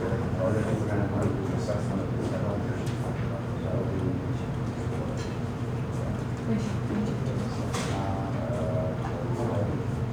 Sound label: office, ambiances; CosmicRage